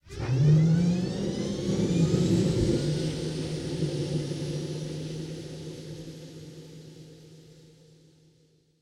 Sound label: apache engine start; War Thunder